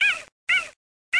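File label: cheetah acinonyx jubatus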